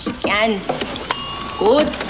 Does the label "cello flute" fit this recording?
No